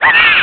Shock Burn; getting shocked or burned; Link getting shocked or burned; zelda

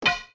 Arrow Bounce Stone; An arrow bouncing off stone (2 pitches) (Recorded)

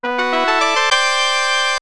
Get Heart Container; Getting a heart container (full heart); LTTP

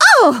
Hurt; The Lady getting hurt

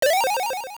Gale Seed; Use a Gale Seed (also scoring a hit in Target Carts); Zelda Oracle

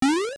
Enemy Jump; An enemy jumping; Zelda Oracle

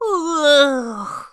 Sleepy; Link being sleepy (intro scene); zelda

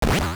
Bomb Blow, Bomb exploding; LOZFDS

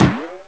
Bounce; Tingle or an enemy bouncing (off a platform or one another)

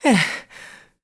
Stamina Out; Link out of stamina; Skyward Sword; zelda

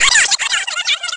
ChuChu Die

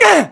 Smashed; Link

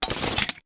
World Map Off; Toggle the World Map

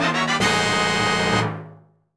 Fanfare Get Item; "Get Item" fanfare (also used in Phantom Hourglass)

